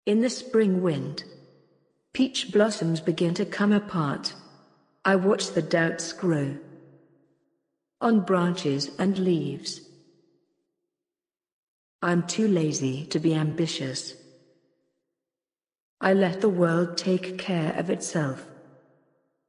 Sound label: electronic, 120, Gbm, Acapellas